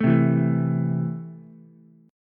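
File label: A Minor, Ukulele, chord strum; Am strummed; 2,0,0,0; JGuitar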